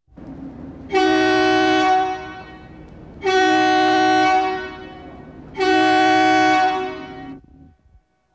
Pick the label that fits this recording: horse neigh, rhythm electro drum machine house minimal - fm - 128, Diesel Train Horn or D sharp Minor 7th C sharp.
Diesel Train Horn